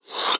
Balloon Blow

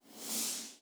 Soft Slide Medium

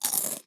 Cracker Bite Crackle

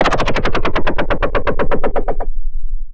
Decelerating Sci-fi Rumble

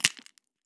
Small Dry Crackle

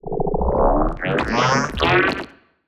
Sci-fi Organic Vroom

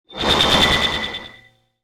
Oscillating Sci-fi Transition

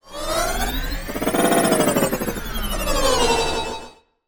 Sci-fi Engine Rumble

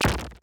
Sci-fi Pulse Gun Blast

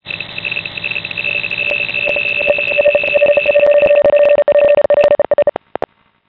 Beeping Digital Glitch

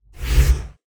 Swoosh Transition

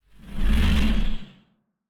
Swoosh Transition

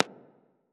Echoing Pop

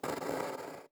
Hard Slide Slow

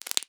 Multiple Small Pops

